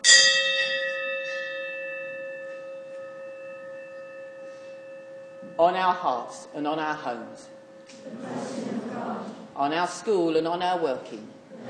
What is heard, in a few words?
Aleppo bell